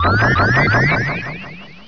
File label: accel; Sonic R More; PC